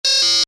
Tech beeps, Beeps, High Tech; Stuart Duffield

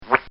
Cartoon Sound Effects Spit; Cartoon Sound Design

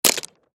Pocket Knife Open; Pocket Knife Open Drop Rocks; Drop Sound Effects; Foley; Objects; Blastwave

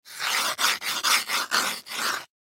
Ice Block Scrape Top; Ice Block Scrape Top Back Forth; Weather; Ice Sound Effects; Part of Ice (Sound Effects:Weather); Blastwave

